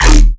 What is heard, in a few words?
BASS SHOT